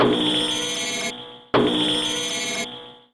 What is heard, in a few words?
yellow alert, ships combat; CosmicRage